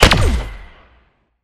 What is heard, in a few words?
weapon; Devourer of Souls